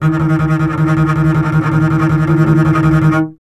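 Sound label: string contrabass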